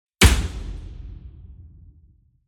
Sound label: Impacts SIMPLE; soundbits